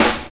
tight lows snare drum d sharp key, Royality free snare drum tuned to the D# note